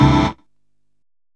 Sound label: DR. LOOP, TRANCE; X-Static Goldmine 3